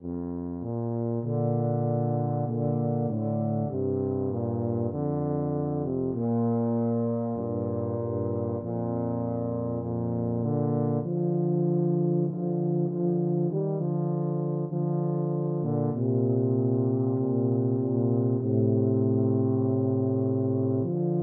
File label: were you there TBA DUET